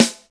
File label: good jazz acoustic snare sound a sharp key